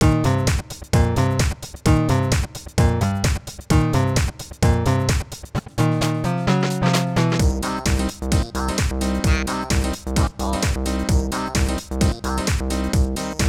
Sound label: Metal, House Remix